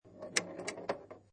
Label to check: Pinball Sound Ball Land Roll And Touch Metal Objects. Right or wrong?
Right